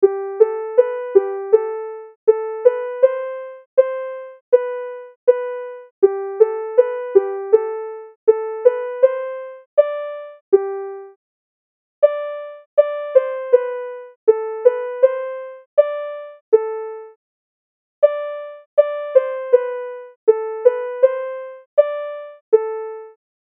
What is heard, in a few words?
piano